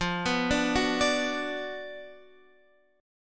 Gb Major 7th Suspended 4th Sharp 5th F, chord

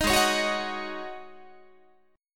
Ab 7th Suspended 4th Ab down Guitar

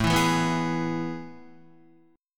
A Major 7th Suspended 2nd, Guitar; AM7sus2 strummed; JGuitar

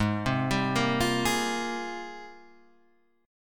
G sharp Major 13th G sharp, down

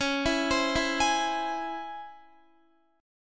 Db Minor Major 7th Db down Guitar; DbmM7 arpeggiated; JGuitar

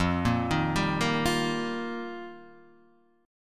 F 9th Suspended 4th F Guitar Open D, chord

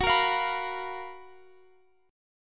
Gb Major 11th, down, Guitar, Open F; GbM11 strummed

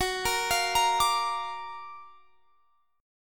Gb Augmented Major 7th; Listen to Gb+M7 arpeggiated

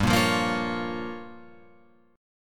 G Minor 7th Flat 5th, Guitar Open F; Gm7b5 strummed; JGuitar

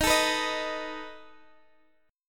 B 7th D sharp

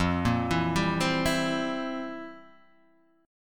C 7th F, chord; arpeggiated; JGuitar

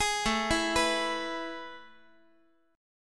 A Major 7th Suspended 2nd, Guitar; AM7sus2 arpeggiated; JGuitar

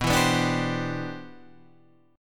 G 6th Add 11th B, chord; G6add11/B Chord {7 7 9 7 8 8} strummed; 6add11:1,3,(5),6,11

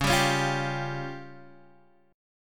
C sharp Major Flat 5th, Guitar Open G

